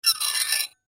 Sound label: Fork Scrape Plate, Foley Fork Scrape Plate Squeak Silverware; Food; Household; Blastwave